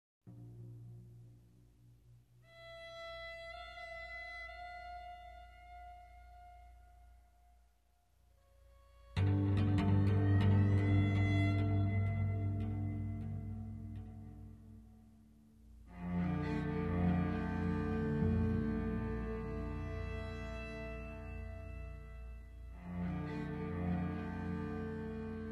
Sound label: electronic landscape